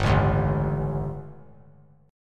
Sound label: G Suspended 2nd Flat 5th G, chord; Gsus2b5 strummed; JGuitar